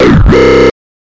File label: two part harsh bass synth; Basses